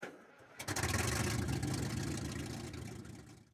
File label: start engine, engines; World of Tanks